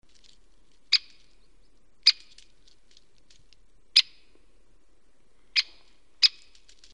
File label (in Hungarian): nagy fakopancs